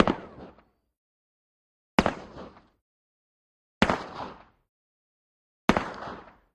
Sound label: Russian SKS Single, sks 7x6